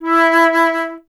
flute E; Miroslav Vitous - Solo Instruments VOL-2; Solo lnst. II

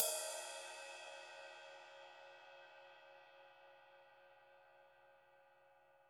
MED RIDE, RIDES; Greytsounds VOL-1

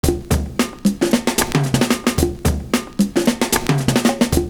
CORNER FILL, loop; Univers Sons - Kitch 70s

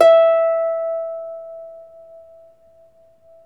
Troubador Harp

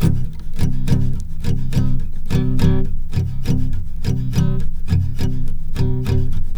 AC C#3 R, guitars; Spectrasonics; Hans Zimmer Guitars VOL-1

